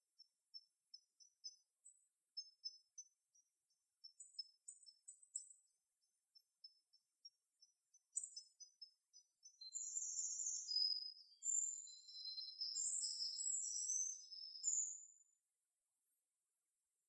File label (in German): Lichtung Soundaufnahme Botanischer Garten Marburg